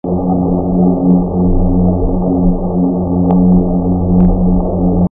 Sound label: midshipman; sanctsound